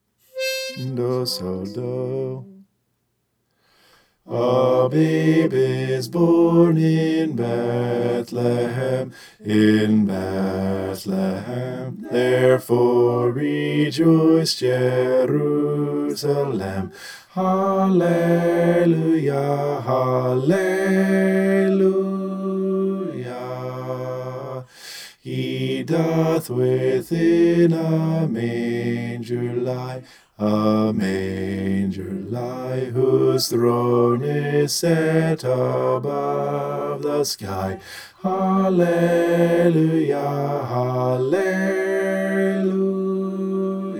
a babe is born bass

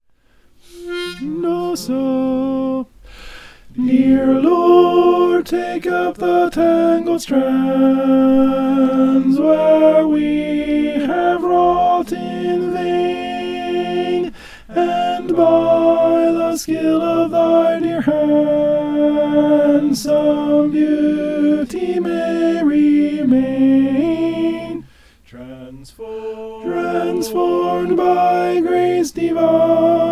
alto

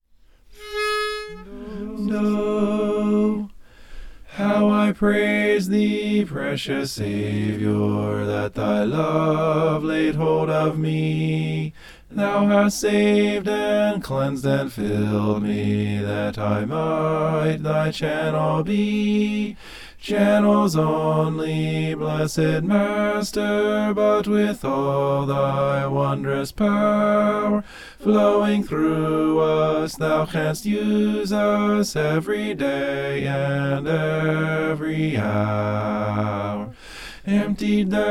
channels only bass

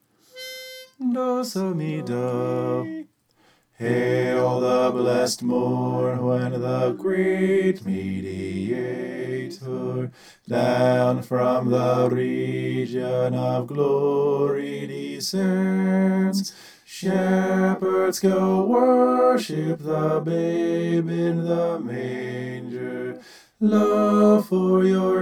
bass

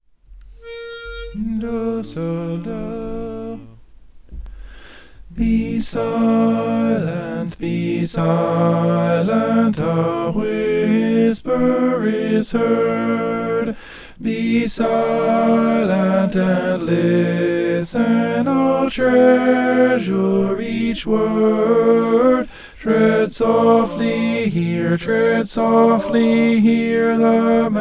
tenor

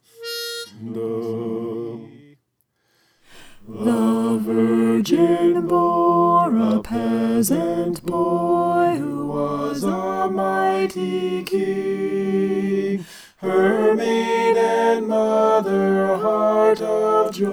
soprano

